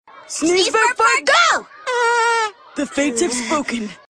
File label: Sneeze Burp Fart